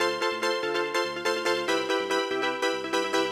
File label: Starman World Map Screen